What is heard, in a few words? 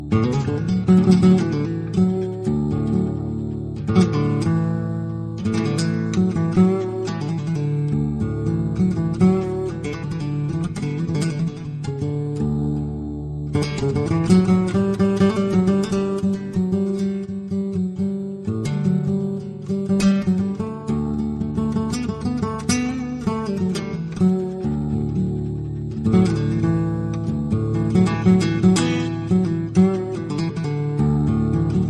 Guitar